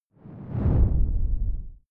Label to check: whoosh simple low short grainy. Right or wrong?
Right